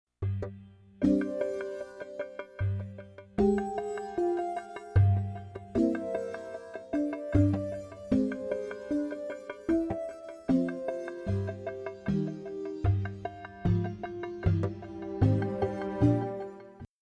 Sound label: Loading Screen; Pikmin 3